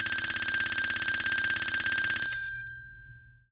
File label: alarm bell